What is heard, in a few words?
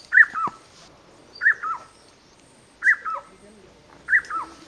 Rusty-cheeked Scimitar babbler calls, Thoolakharka Gandaki Zone Western Region Nepal